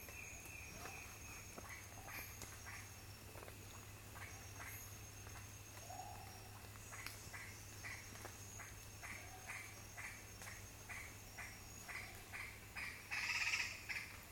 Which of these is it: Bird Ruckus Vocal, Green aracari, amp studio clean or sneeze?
Green aracari